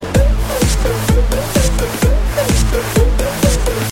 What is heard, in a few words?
piano